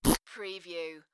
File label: Short raspberry; beanstalk audio